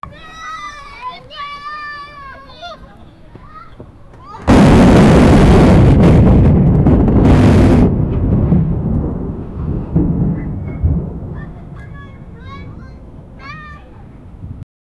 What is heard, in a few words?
a thunder and kids playin; joyful